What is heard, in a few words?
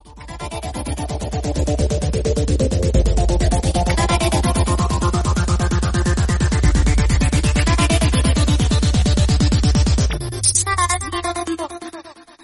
japanese techno